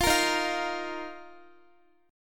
Eb Suspended 2nd, Guitar; Ebsus2; strummed

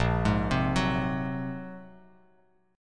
Bb 7th Suspended 2nd Sharp 5th Bb, Bass Standard; Bb7sus2#5; arpeggiated; 6,11,10,11; JGuitar

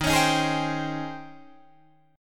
D sharp 13th, Guitar, Drop D

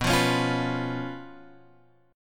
B Suspended 2nd Flat 5th, Guitar, Open G

